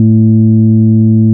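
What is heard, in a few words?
Juno 106 resonant band pass A1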